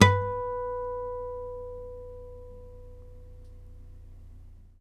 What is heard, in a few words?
NYLON HARM B, GUITARS; Synclavier Sampler Library